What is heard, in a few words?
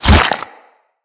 Bullet Flesh; Impacts